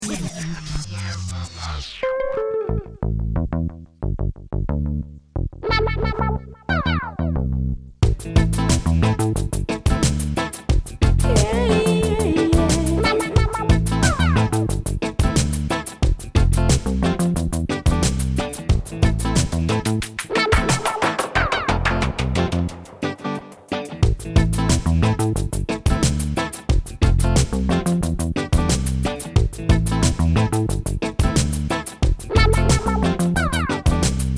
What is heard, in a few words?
bass boogie